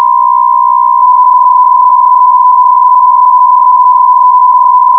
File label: BPSK63